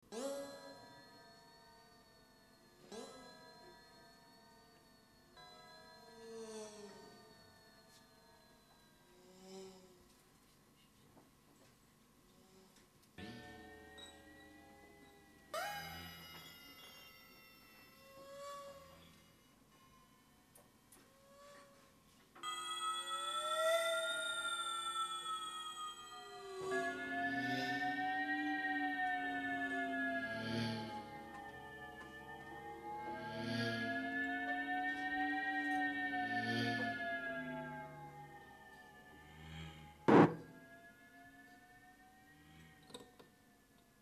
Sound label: Rain